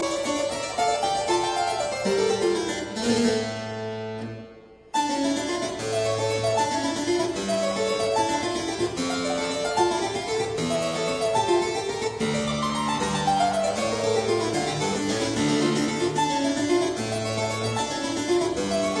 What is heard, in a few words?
Keyboard Sonata in G minor; P 427